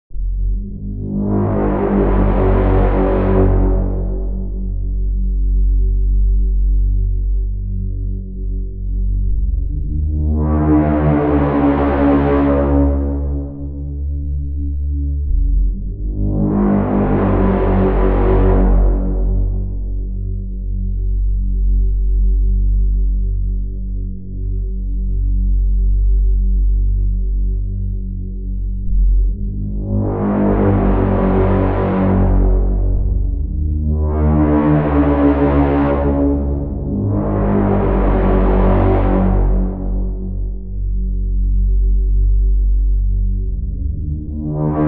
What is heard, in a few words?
hells horns sound effect